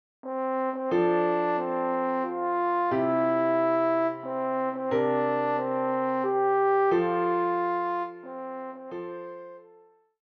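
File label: horn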